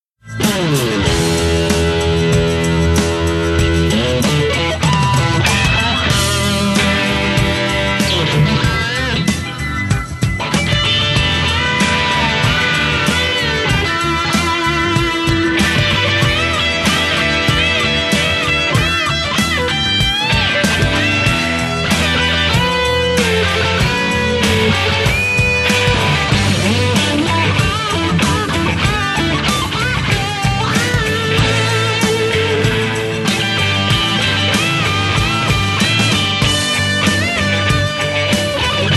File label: SOLO